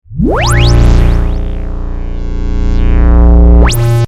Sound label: Synth, Stab, Pitch, Sweep; 5.1; Sound Effects:Science Fiction; BlastWave